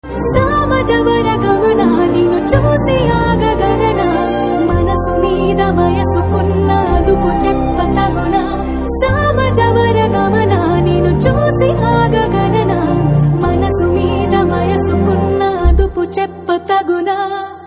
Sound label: Female Cover Ringtone